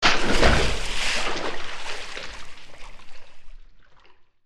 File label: body falls in water